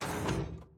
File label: gate open; factorio 2.0.55